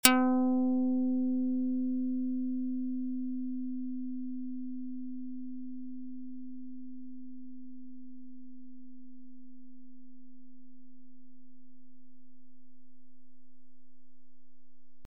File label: karplus Strong; FSound